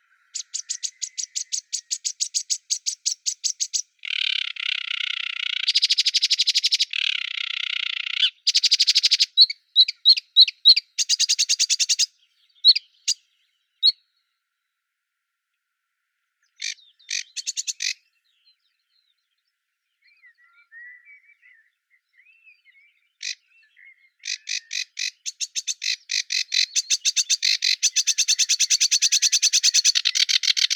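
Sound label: sedge warbler, Acrocephalus schoenobaenus; kamishovka barsuchok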